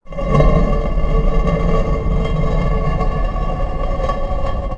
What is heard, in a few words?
stone, tones